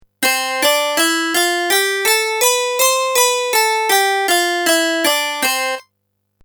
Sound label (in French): Gamme Pythago